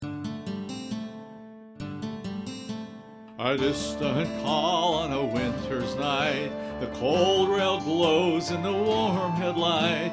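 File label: train train christmas train with vocal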